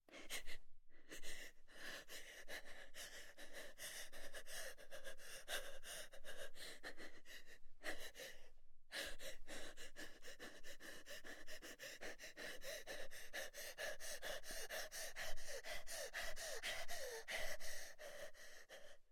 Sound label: Human, Adult, Female, Breathe, Growing Panic; UberDuo; Character Playset